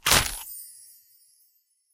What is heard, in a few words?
Cookie Break; Angry Birds Seasons